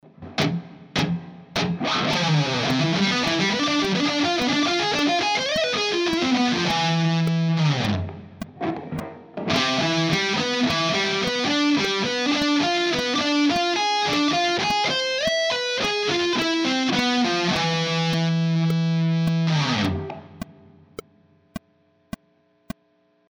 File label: harmonic major, guitar